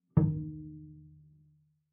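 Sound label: Bass pizzicato fortissimo sul A Eb2, Double Bass; MIS Pitches - 2014; Strings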